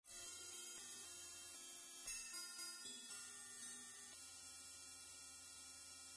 Game Over; Strider; Genesis

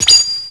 ROCK

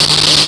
ROCK

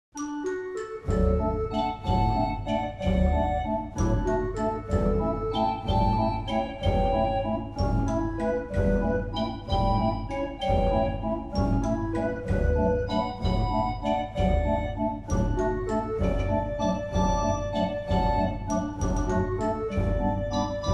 Fairground Organ; Ren & Stimpy

